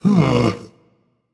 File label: nv ugh; smite; voicepack; ganesha